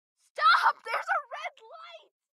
town human road; Blind Drive

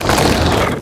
Coffin Emerge From Ground; SFX Kit; Foley - Misc

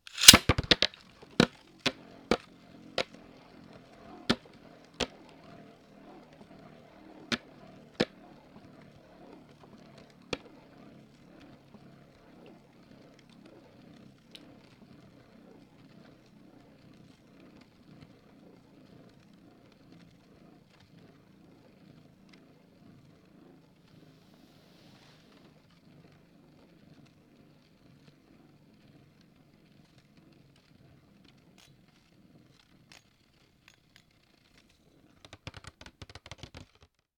bayblade; devices accessories utilities; NS Studios - ultra sound collection

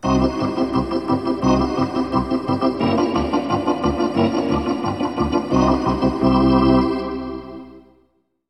Hockey Organ

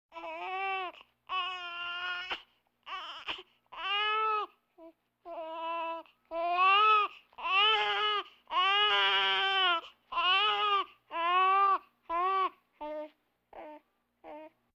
Vocal effects breathing Moaning Baby

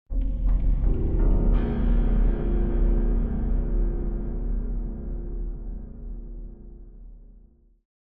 Arpeggiated Broken Chord - Pitched Piano Musical; Hollywood Edge; sound designer's toolkit v2

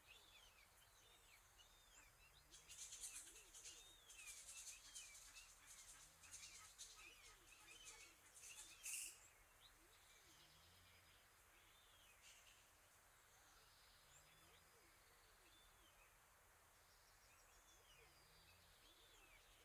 coastal seagulls; Blind Drive

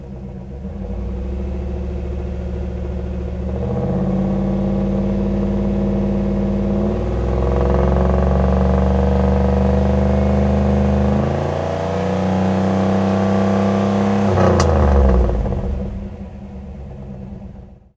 Z28 Fast Idle, Automobiles

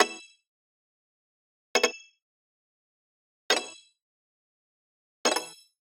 Digital Click Positive

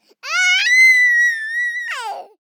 BABIES LAUGH Boy 12 months Screaming Loud Short; Baby Boom

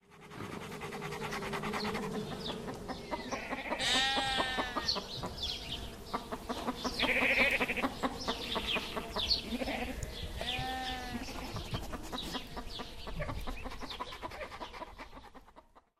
Farmer Ambi; Pitch Black Audio Pong

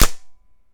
Gun Paintball Shot; Weapons

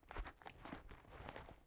headphones Tinker; Apotheorasis

